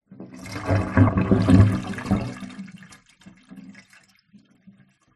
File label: household bathroom water drain gurgle; zapsplat